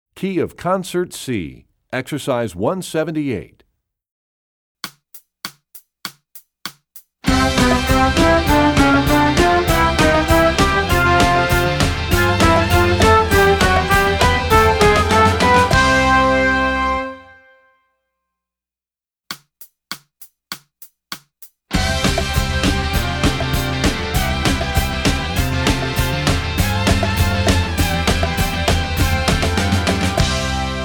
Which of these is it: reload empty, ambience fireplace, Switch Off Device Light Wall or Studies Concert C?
Studies Concert C